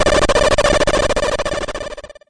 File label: birth, video games; pulsar